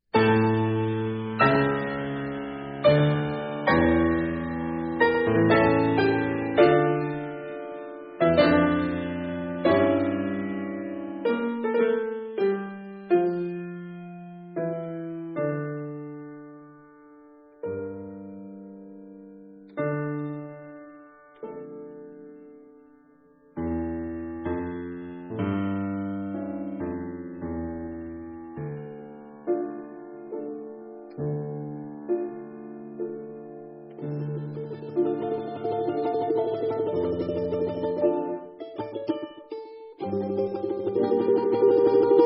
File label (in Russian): балалайка; В. Андреев; Румынская песня и чардаш